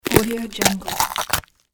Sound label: bones cracking